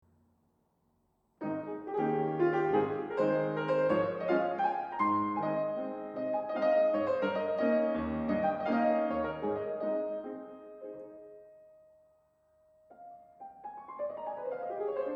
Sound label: Piano Trio; tchaikovsky piano trio in a minor op 50